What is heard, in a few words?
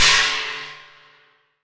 JABARA CYM, KOREAN DRUMS; AKAI S6000 CD-ROM; WorldSounds